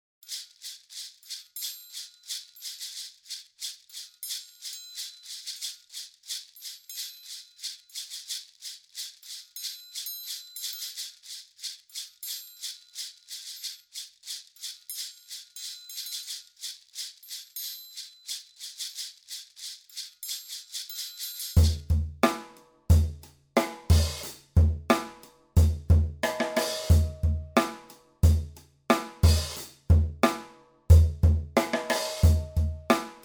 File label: descenders drum